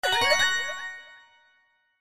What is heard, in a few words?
Win Scatter; slots; Robot Vs Monster